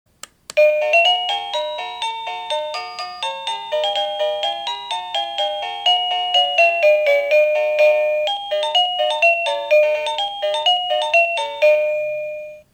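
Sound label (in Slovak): Bezdrôtový zvonček melódie ukážka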